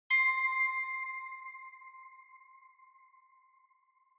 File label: Bell Accent Low